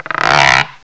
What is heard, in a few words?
GUIRO; CMI Sound Library Rev 1.3